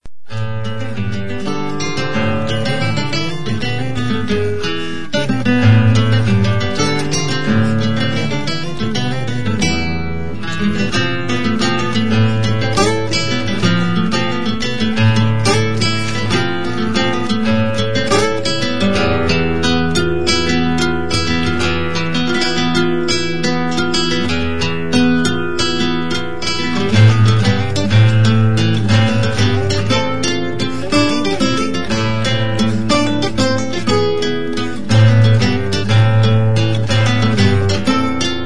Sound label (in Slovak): gitara